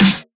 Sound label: Snare